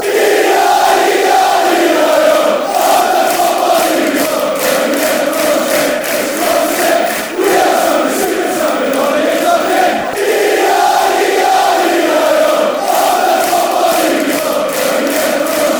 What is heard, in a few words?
eieieio, fan chants; tranmere